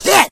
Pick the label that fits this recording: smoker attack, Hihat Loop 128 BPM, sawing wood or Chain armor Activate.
smoker attack